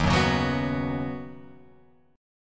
D sharp Minor 7th Sharp 5th D sharp, down, Guitar, Open F; D#m7#5 strummed